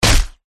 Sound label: human punch smack crunch; zapsplat